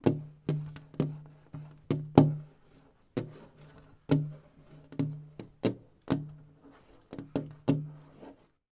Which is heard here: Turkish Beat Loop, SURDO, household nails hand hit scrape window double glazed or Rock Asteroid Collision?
household nails hand hit scrape window double glazed